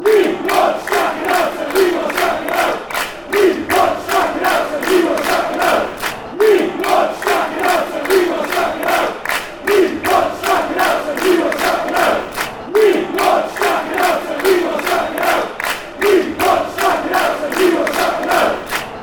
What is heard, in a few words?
we want out, fan chants; strachan